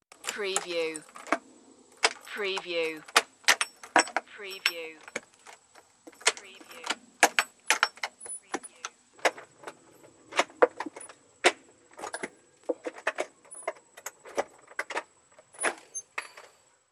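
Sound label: Front Door Lock Trouble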